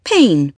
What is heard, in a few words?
pain